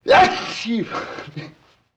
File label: LoFi Man Sneeze